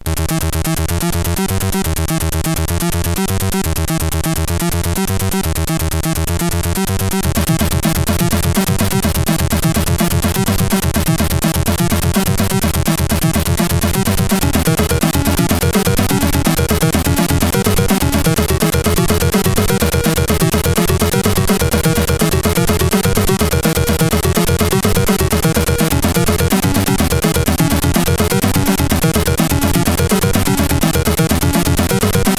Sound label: sid, CSG8580R5; Metal Maniac; hvsc